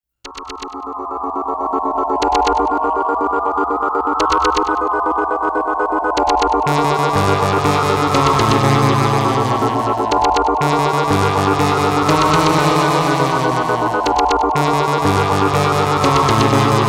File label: Ghost Attack